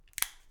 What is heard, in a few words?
Snap